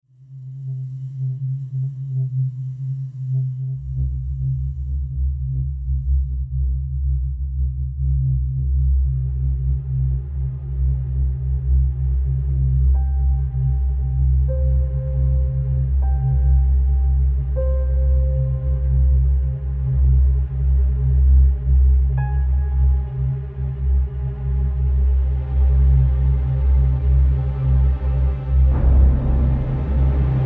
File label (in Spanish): Requiem ambiente orquestal terror